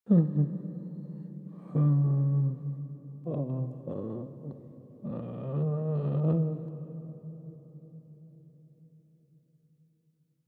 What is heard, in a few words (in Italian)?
lamento uomo; Inquisitor 2